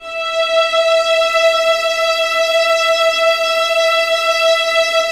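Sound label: 1st violins sustain E5; Sonatina Symphonic Orchestra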